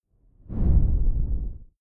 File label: whoosh simple low short grainy; swooshes-swishes